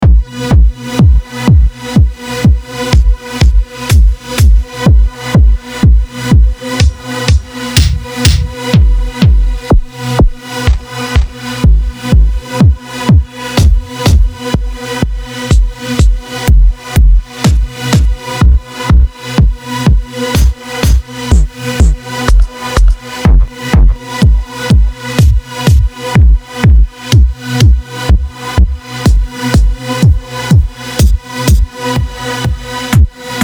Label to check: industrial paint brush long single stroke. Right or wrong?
Wrong